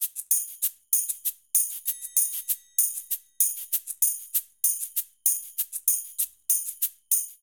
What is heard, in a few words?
Fusion 3 4 Maracas Tambourin Cabasa 97bpm, Latin Percussion; Ableton; Factory Packs